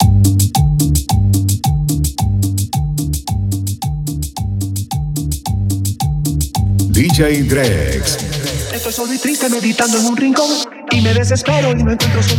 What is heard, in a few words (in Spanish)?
acapella